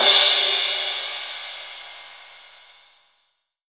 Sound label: POWR CY, Crash Cymbal, MONO CRASH; AKAI S6000 CD-ROM - Volume 3